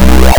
FIRE SND

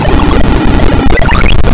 TELEPORT SND; Keen1

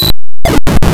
USE KEY SND; Keen1

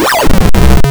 SLIME SLOP; Dangerous Dave

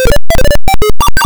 extra man sound

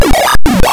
GOT ITEM SOUND

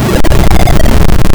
CHUNK SMASH; Keen 2

